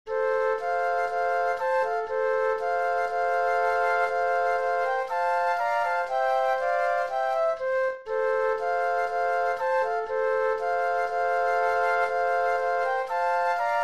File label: Flute Trio; christmas